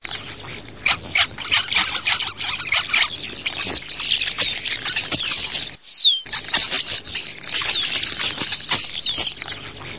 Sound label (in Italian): STORNI